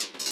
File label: perc; foxdot default